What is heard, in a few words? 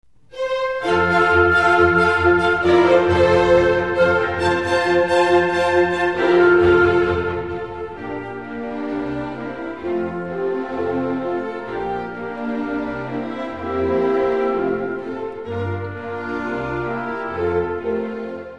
piano; mozart